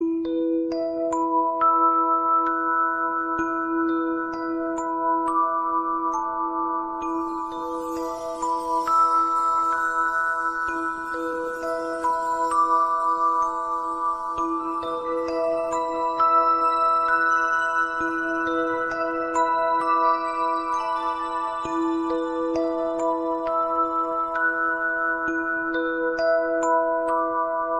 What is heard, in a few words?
beautiful bells